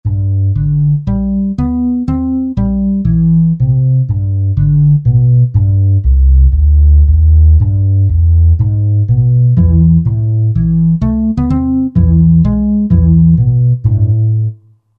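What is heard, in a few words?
Guitarron Bass; World; Latin; Roland SR-JV80-18 World Collection Latin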